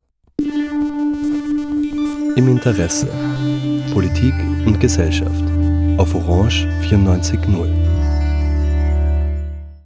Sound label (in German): spartenjingle; im Interesse; orange